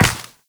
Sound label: Step Ice, Footsteps, Walk; Warframe; Tenno